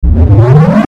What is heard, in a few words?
Ascend Low Hum Buzz Fuzz; Blastwave